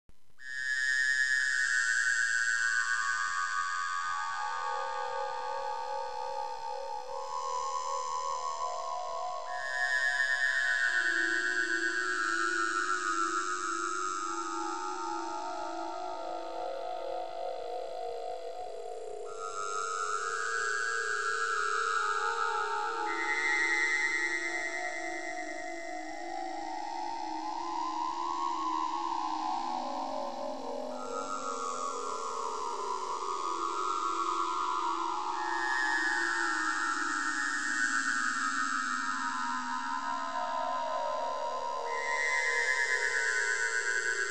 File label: ufo; 未確認飛行物体; meditation